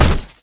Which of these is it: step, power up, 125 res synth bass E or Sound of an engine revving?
step